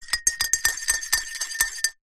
household teaspoon stir tea or coffee in ceramic cup mug fast